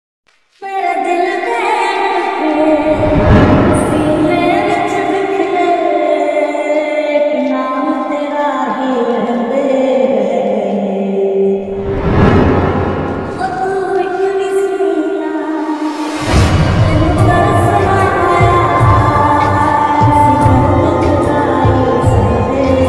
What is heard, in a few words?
sad mood off ringtone love ringtone Hindi ringtone